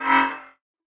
Warning Beep Slow; Barotrauma; Barotraumatic; Penance

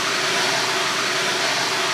extinguisher; Tools; Subsurface; Barotrauma

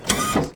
missile dock; Weapons; ekgunnery; EK modern; Barotrauma

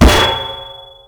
cannon shell; ap; Weapons; ekgunnery; EK modern; Barotrauma

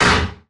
grappling hook impact; Tools; Barotrauma; EK modern

